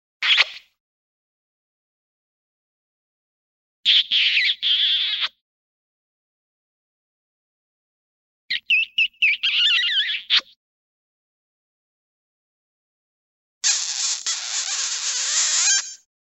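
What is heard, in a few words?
kiss sounds compilation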